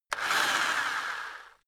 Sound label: rock drill rev reverse medium